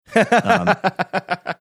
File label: chuckling